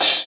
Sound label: Crash short; osu